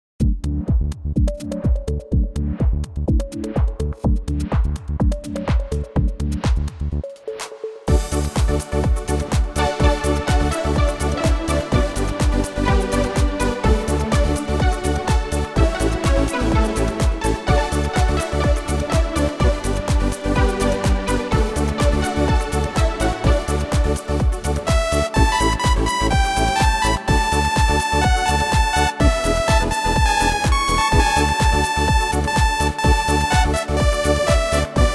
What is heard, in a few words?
Disco